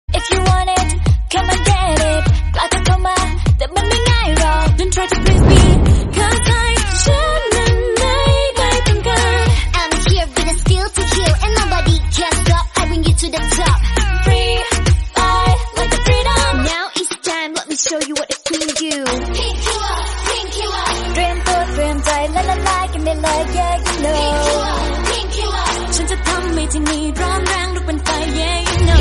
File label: english song tone ringtone